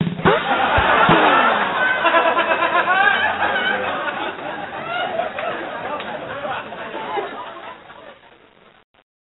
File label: Jingle Joke; Neighbours from Hell; NDS